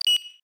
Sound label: tech oneshot, office